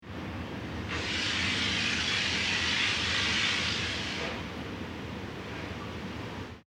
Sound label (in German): Industrie Sandstrahl